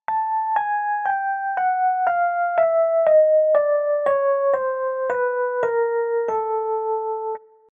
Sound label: Chromatic Descending